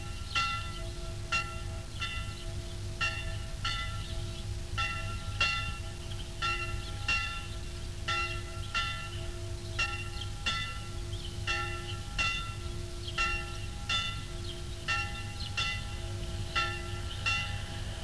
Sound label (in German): Glocke Brenndorf